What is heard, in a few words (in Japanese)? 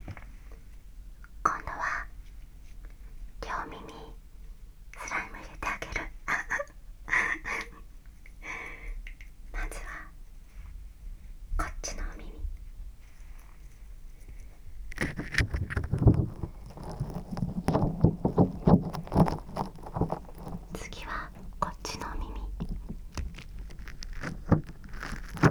スライム両耳詰め